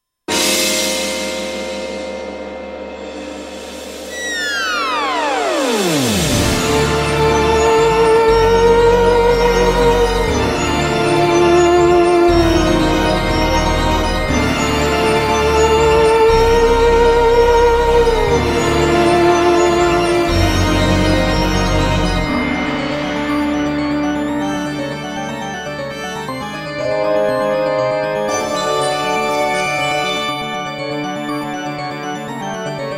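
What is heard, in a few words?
theremin; HOLLOWEEN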